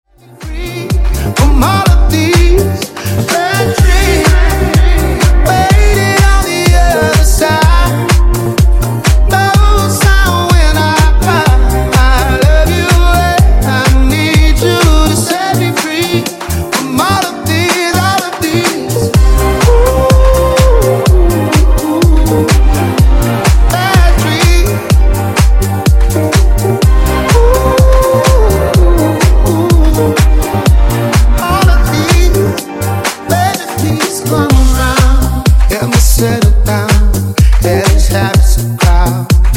Disko Funk 125Bpm

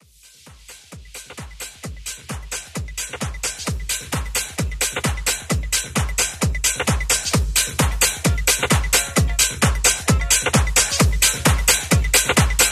90s Funk House 131 BPM

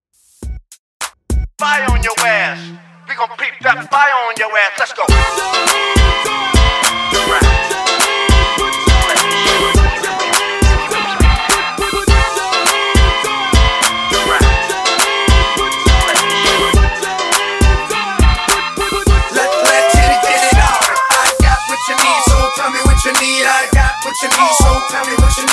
Throwback Party Re Drum Hype Intro 103 BPM

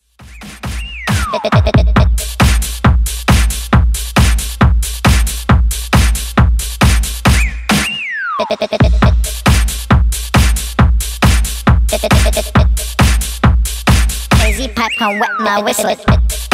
Extend 136bpm; DJ Bomba

